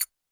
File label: percussion, Roland TD-20